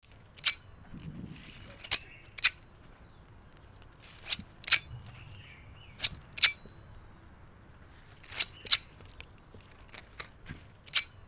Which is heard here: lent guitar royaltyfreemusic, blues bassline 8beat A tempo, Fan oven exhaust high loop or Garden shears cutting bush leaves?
Garden shears cutting bush leaves